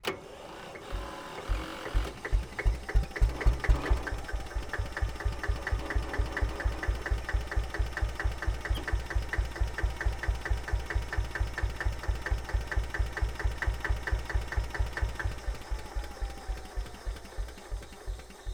Kramer KL11 Start Idle Stop, Oldtimer Tractor; 1955; Sound of Essen

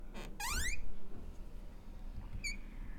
High Squeak, Door; FXHome; Squeaking

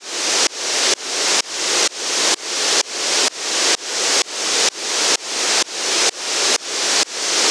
Drop Noise, White Noise

